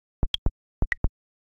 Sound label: diode; Nothing Phone 3; notifications